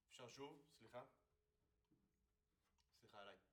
Overheads, 96bpm

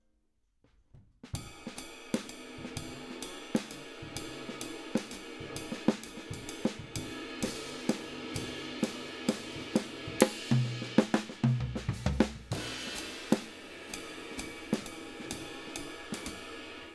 Drums

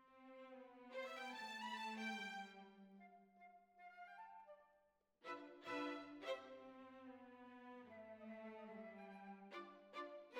Violins Over

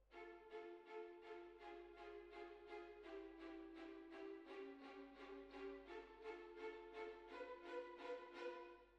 Hall

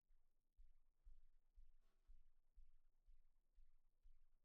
Snare Bot

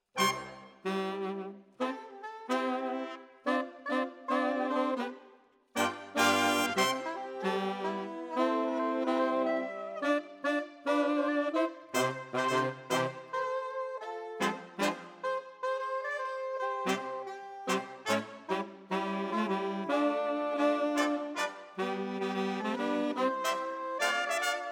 Sax

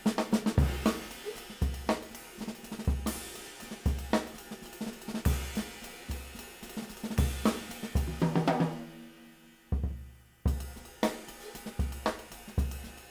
Room Parra

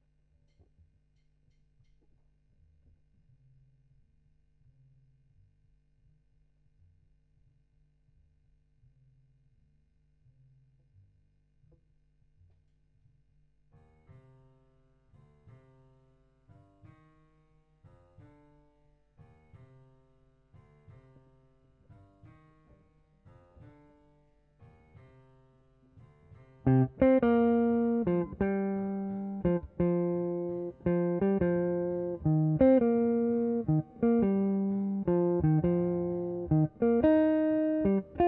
GTR B

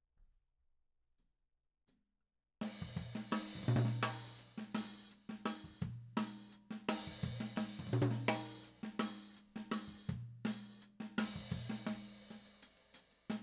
Tom

